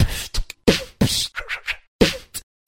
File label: shuwuhwuh, Beatbox; Drum loops